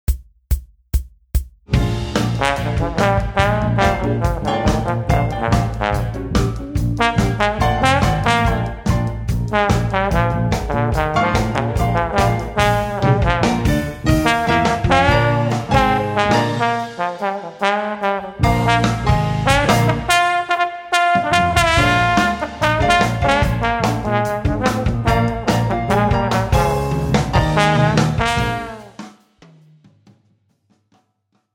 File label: transcribing solos - trombone i chord rock